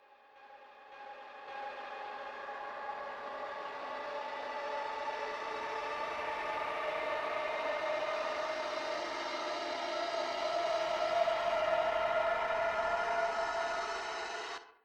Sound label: Rising